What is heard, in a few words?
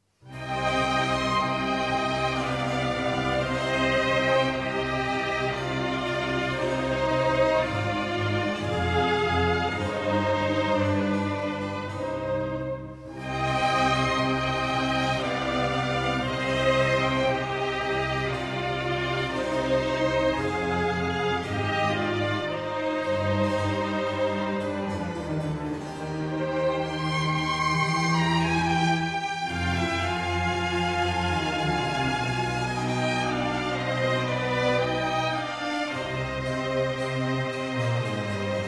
Trumpet Concerto in E-Flat Major, Larghetto; Hertel Trumpet Concerto No. 1